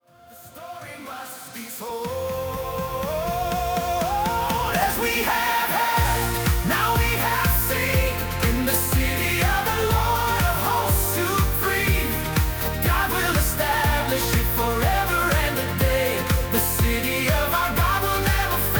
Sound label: Pop Style; Psalm 48 City of Our God; latin pop style